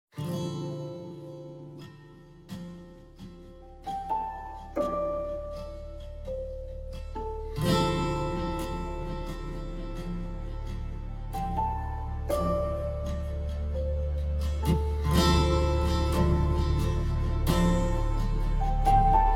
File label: slow morning ringtone